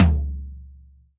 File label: drum, WORLD DRUMS